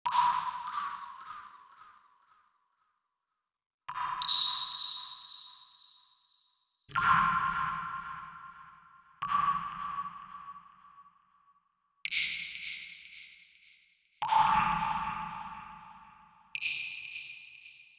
drops

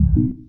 regen

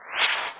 respawn

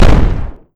bfg fire, weapons

